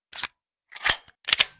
pistol reload, Luger

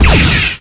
laser rifle fire; Sci-Fi Laser Rifle

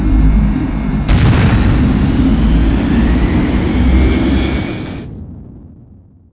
orca start; orcasounds

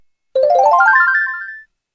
powerup health; mk64crate

